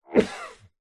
human male man cough single into cloth or arm muffled; zapsplat